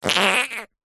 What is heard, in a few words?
human fart squeezed slightly wet; zapsplat